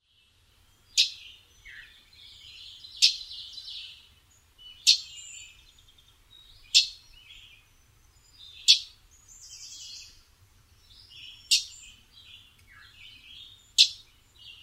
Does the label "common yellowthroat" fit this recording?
Yes